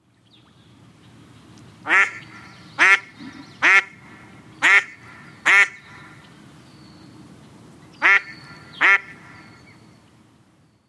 Anas platyrhynchos female; Chordata

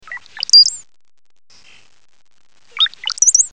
Molothrus ater; Chordata; LandBirds

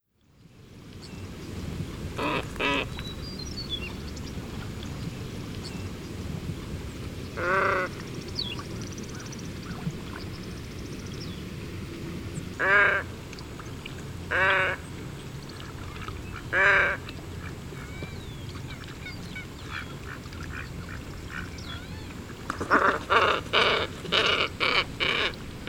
Plegadis falcinellus, Water Birds; Chordata

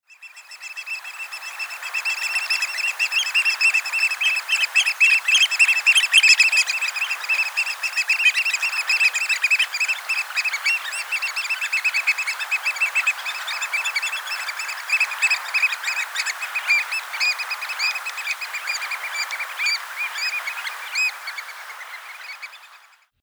Haematopus palliatus, water birds; Chordata

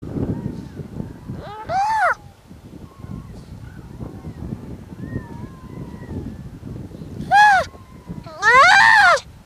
Pagophilus groenlandicus, Mammalia; Chordata

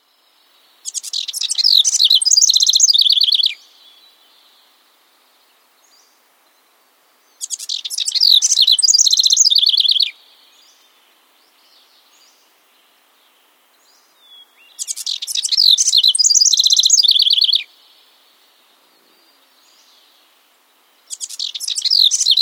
Troglodytes aedon; Chordata; LandBirds

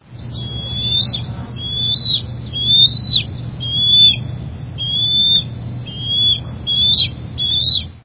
Haliaeetus leucocephalus; Chordata; LandBirds

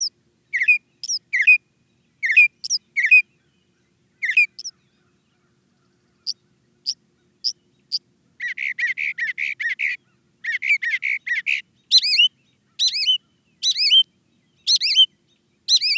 Mimus polyglottos; Chordata; LandBirds

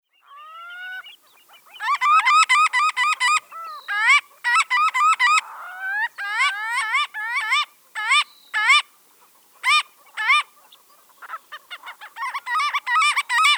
Limosa fedoa, water birds; Chordata

